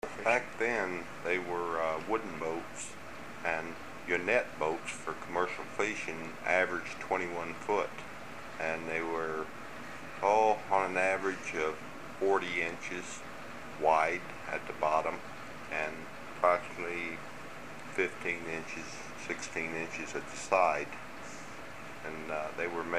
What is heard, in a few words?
boat; harvesting